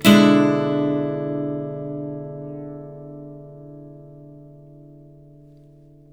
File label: CHORDS, Blues Guitar; ILIO; Fretworks